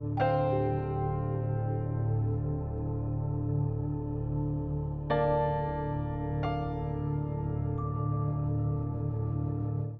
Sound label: Music; Runaway Island Simply Lemonade